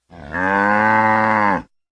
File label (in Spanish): ganado